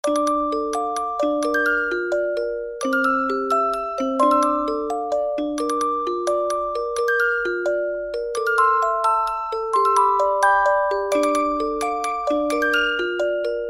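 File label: music box; muzykalnaya shkatulka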